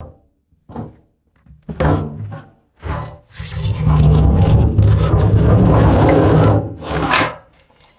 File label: empty metal barrel rolling and scraping